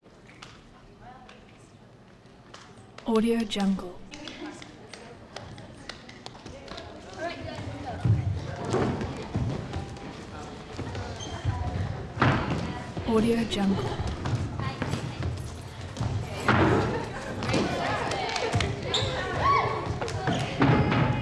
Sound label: gymnastics training teen girls work out; prosoundfx